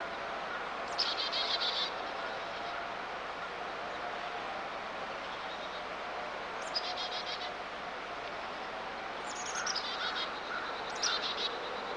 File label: bird